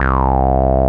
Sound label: A BASS; CMP - Analogon